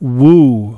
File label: woo